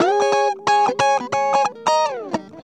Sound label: ACID JAZZ